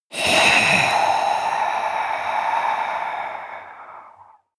breath female exhale heavy scratch

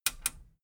metal button radio click enable slow

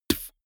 beatbox bass drum dull

